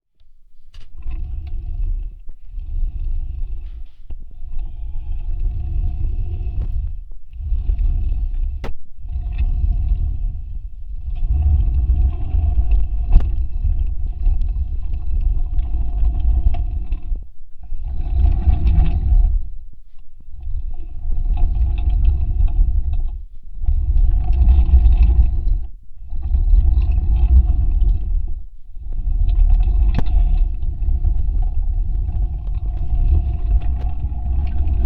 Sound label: chair